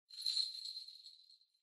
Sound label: Ring Bells Dry Noise